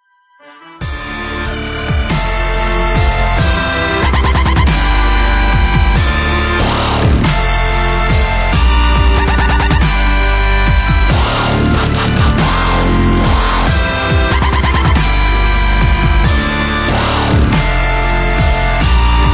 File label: dubstep re-orchestration; the elder scrolls